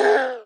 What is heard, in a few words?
screams human